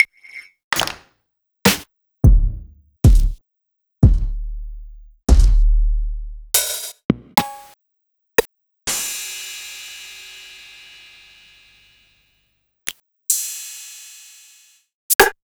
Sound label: Roland MV8800 Dirt & Grime Kit